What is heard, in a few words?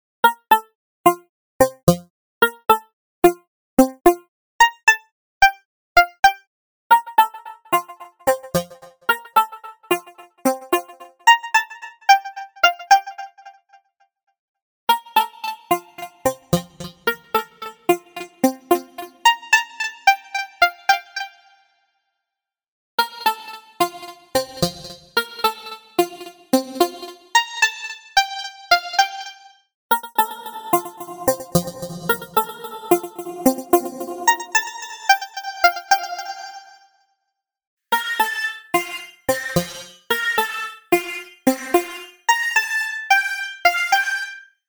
Synth; RingX